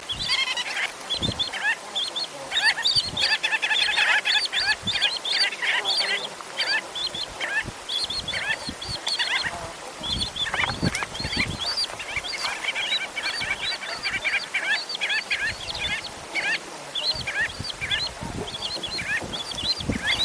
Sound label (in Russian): chaykonosaya krachka golos